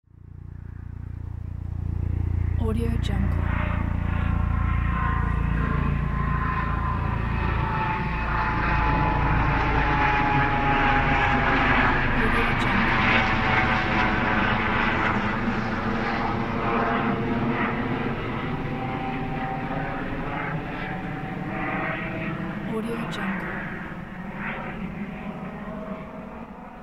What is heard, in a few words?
helicopter flying overhead; aetherfx